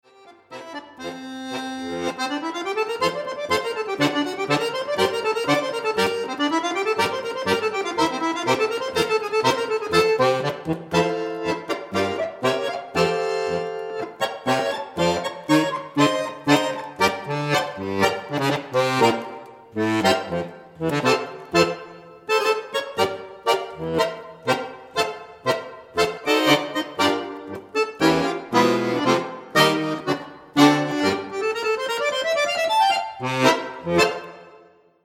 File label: accordion tango